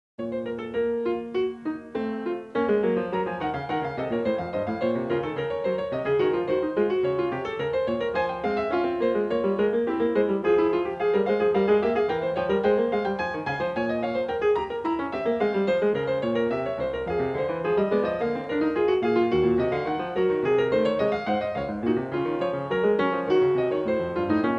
Prelude No. 22 in B-flat minor, Bach, Well-Tempered Clavier; BWV891